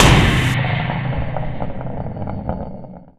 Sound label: SLAMMIN